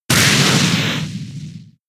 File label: Fire Punch; pokemon sfx gen 7 attack moves sumo usum